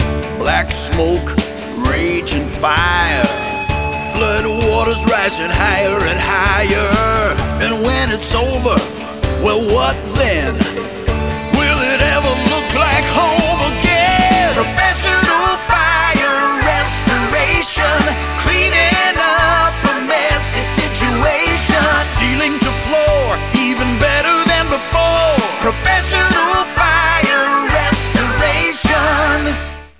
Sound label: Professional Fire Jingle